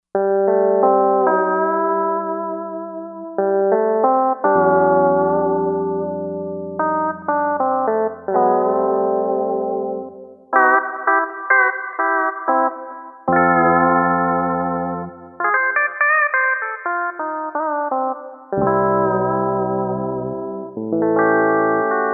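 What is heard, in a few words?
Guitar Pedal Steel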